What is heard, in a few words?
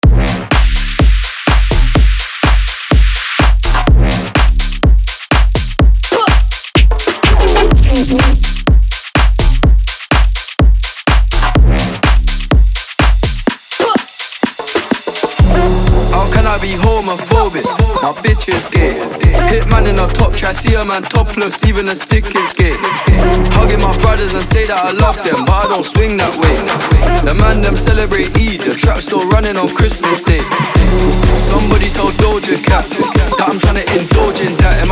Mashup; 2023 final mashup pack